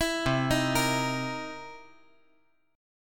C 7th Sharp 9th C

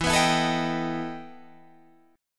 E Minor Major 11th E

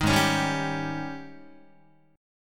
G sharp Major 7th Suspended 4th, Guitar

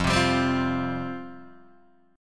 F Suspended 2nd F Guitar DADGAD

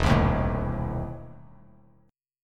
E Major 7th Suspended 4th Sharp 5th; EM7sus4#5 strummed

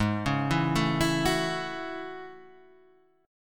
A Major 13th, guitar

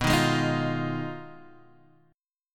Ab Minor 6th B

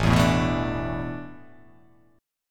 A Suspended 2nd Suspended 4th; Asus2sus4 strummed

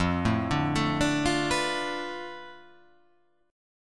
F 6th Flat 5th F down, chord

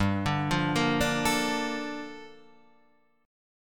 Bb Major 7th, G; Bbmaj7/G; arpeggiated; JGuitar

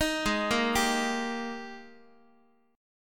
Ab Major 7th Suspended 2nd Ab down Guitar; arpeggiated; JGuitar

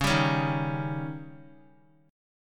Db Suspended 2nd Flat 5th, Guitar; strummed; JGuitar

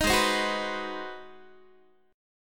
G sharp 7th Sharp 9th, Guitar; G#7#9; strummed

